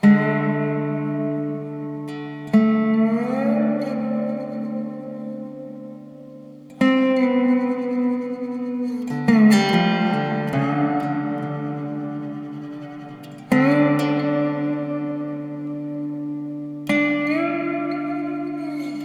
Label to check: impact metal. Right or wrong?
Wrong